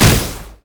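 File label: virulent slash uncharged; LoL; skarner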